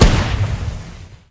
flaregun; Weapons; EK modern; Barotrauma

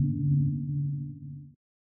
sonar Tail Air Far; Barotrauma; Real Sonar

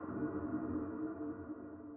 sonar Tail Air Far Shuttle Directional; Barotrauma; Real Sonar

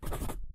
scribble; Barotrauma; More Level Content

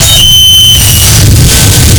sonar Ping Water Very Close; Barotrauma; Real Sonar

